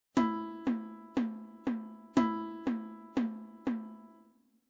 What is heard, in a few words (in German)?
Notenwerte Ganze